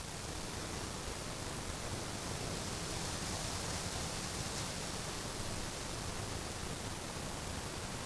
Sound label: light wind; hl1mp; dm tropic